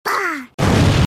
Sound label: pew loud boom